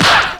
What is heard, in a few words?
70s Hit; Drums; Odd Bitz and Hits